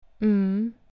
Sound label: Mmhmm